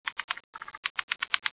cricket idle; gearbox

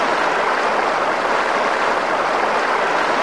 running water; tfc

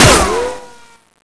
cement, debris

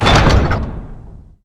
mom door close; svencoop

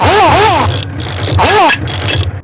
building

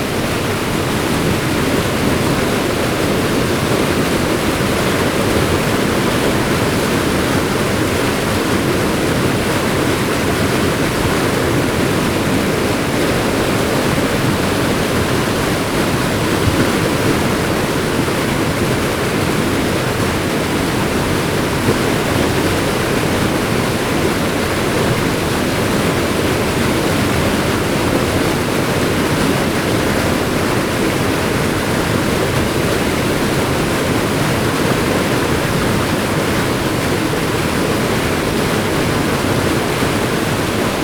dam waterfall; svencoop; russian sounds

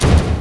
energy sing explosion

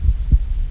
heartbeat; svencoop; bluecell

